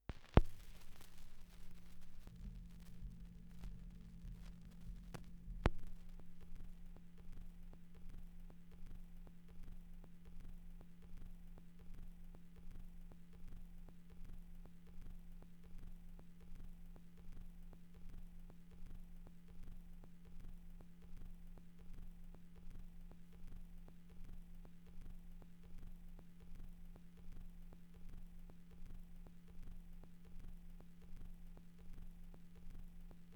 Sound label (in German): Schallplattengeraeusche 78rpm; wendelrekords 1.2